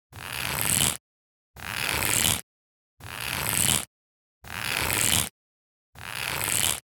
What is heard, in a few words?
Leather Grip